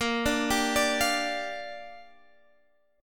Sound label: Bb 6th, Guitar, Standard; 18,17,17,19,18,x; Bb6 arpeggiated; JGuitar